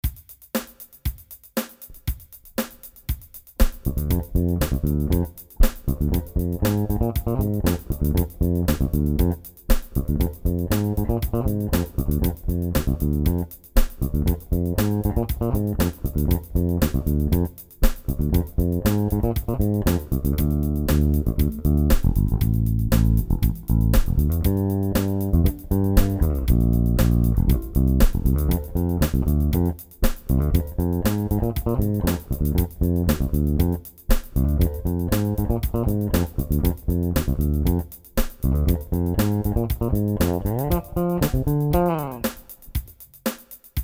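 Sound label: finger and slap bass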